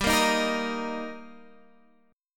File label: G Minor Sharp 5th G Guitar Half Step Down, chord